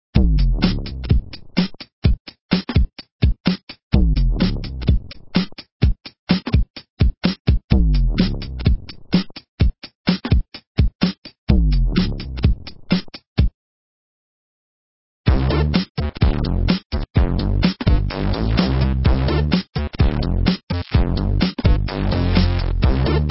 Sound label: Explode Arena2 game sountrack; Explode Arena OST